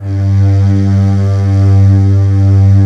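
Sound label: strings vox G2; VOXSTRING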